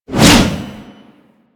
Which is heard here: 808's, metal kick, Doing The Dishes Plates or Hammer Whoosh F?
Hammer Whoosh F